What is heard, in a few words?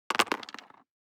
DICE on Cardboard, Throw and Roll, Standard, Five Dice; Samples; ArticulatedSounds; DICE Sfx Party (Extended)